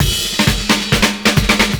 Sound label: CYMB, Breakbeats